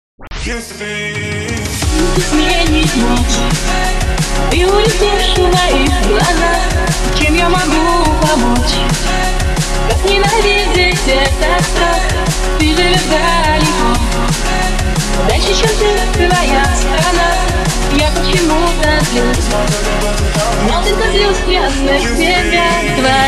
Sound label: dnb; mix DNB